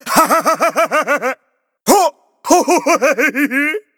laugh; a hat in time

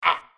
hurt

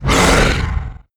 roar short; tf

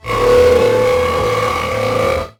burp; garfield

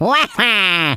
wah; waluigi

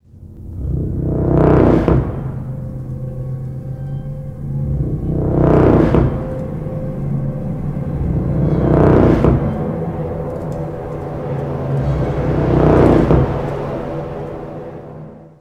cannibal search; frank

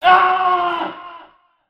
scream

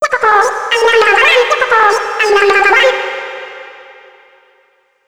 retro; slender; isabelle

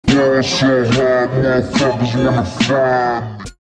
death; tf; redsun; dbkit

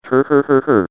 laugh evil; John Madden

